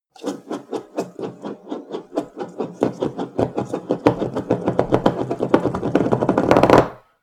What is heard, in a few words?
cup spin